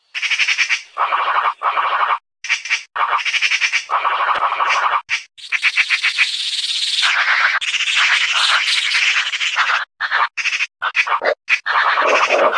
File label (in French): pie, oiseaux